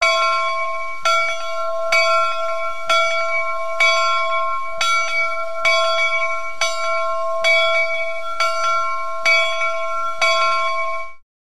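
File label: Steam Train Departure, crossing bells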